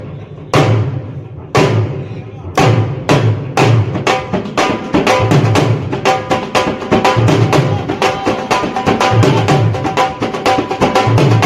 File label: dhol